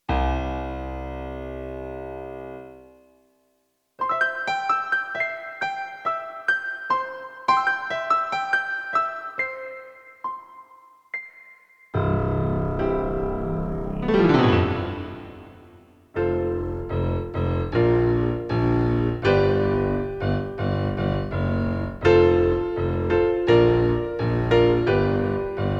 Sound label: piano space prelude